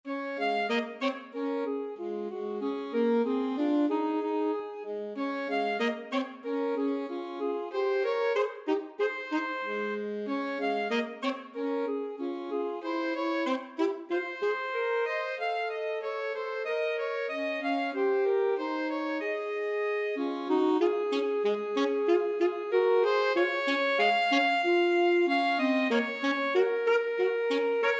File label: alto saxophone duet